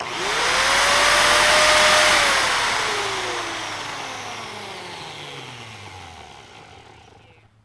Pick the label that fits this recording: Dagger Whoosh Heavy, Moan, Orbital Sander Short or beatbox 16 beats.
Orbital Sander Short